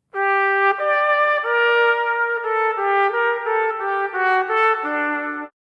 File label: Fugue opening